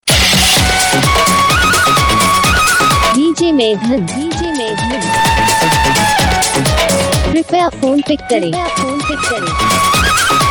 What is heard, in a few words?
DJ RingTone